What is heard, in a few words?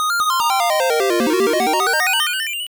intro fanfare; Lunar Sanposuru Gakuen; game gear